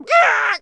hit chickens bukuk; Blind Drive

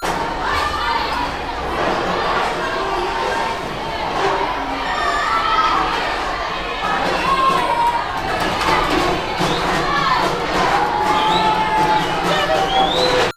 cuba school ambi; best service Blue Box

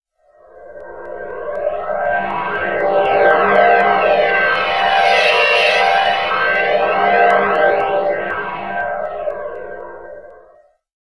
Ticking, Drones; RoosterFX

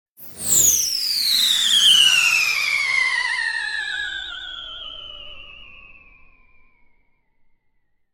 Small Bomb - Firing Whistle; Hollywood Edge; sound designer's toolkit v2

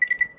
card beep; Apotheorasis

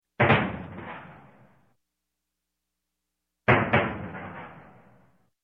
Cannon Explosion; Sound Ideas 2000 Series

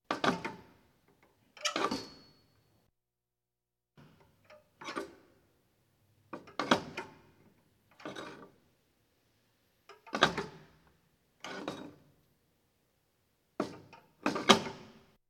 Police, Fire engines

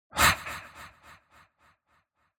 Breath - Demon Sigh Quick Startling, Echo; Hollywood Edge; sound designer's toolkit v2

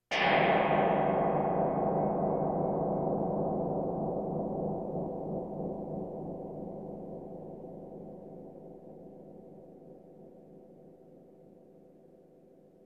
Percussion Bongos Tympani rolls Gongs Drums

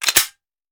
Hand Gun Foley; Sound Ideas; Guns

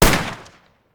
Rifle; Sound Ideas; Guns

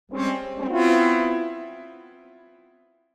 Horn Fanfare Victory Signal - Derisive; Hollywood Edge; sound designer's toolkit v2

